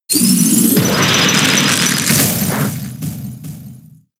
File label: Ice Beam; Pokémon SFX Gen 6 Attack Moves; XY ORAS